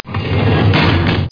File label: Roll Open; Total Distortion